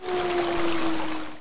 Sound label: Woods And Water